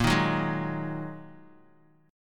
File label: Bb 7th Suspended 4th Sharp 5th Bb Bass Standard; Bb7sus4#5; strummed; 18,18,16,13; JGuitar